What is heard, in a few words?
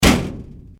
Heater Vent Hit Higher Part